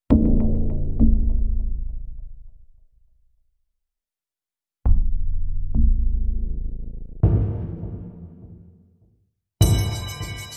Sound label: African Challenges, Percussion